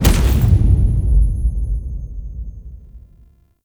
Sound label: Angel Reveal SFX C; Warframe; Zariman Quest